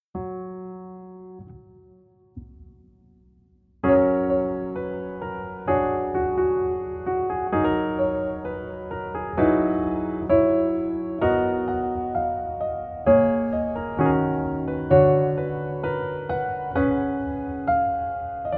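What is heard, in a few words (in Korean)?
Piano version 멜로디 MR 여자키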